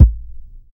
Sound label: verby kickdrum sound A key; kick drum samples